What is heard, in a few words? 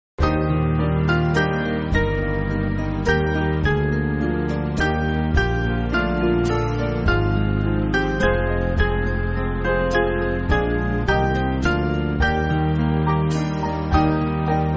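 Band, Music Backing Files for Churches; Anxiety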